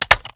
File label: sks reload empty mag out; Tacz1.1.5+; RSWS 1903